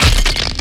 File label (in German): KNOCHENS; Best Service; Advanced Mega Effects